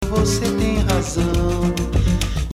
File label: 5 notes Motif